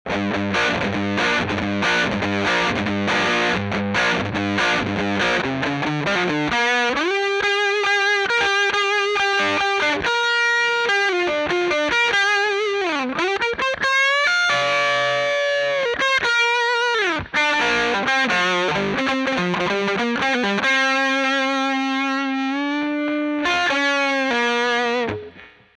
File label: 57 Dirty Guit